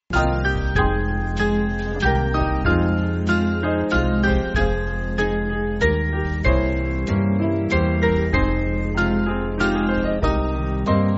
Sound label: Band, Music Backing; Columcille